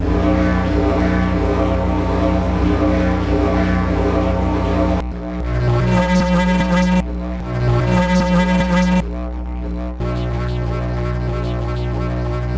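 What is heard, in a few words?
didgeridoo mess; csound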